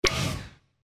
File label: UI refresh